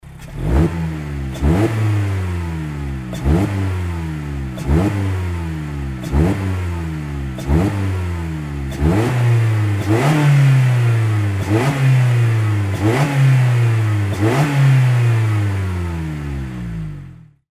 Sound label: hks kyuki